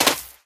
sand land, Sounds; Golden Crayon Sounds

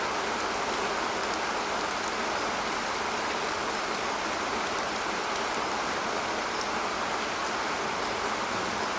Rain; iOS 26 Sounds

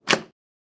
shelter power off; Original TK Sounds

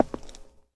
concrete step; Golden Crayon Sounds

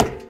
metal step; Golden Crayon Sounds

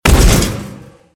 grate fall; Golden Crayon Sounds

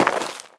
ledge step; Golden Crayon Sounds

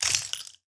broken glass step; Golden Crayon Sounds

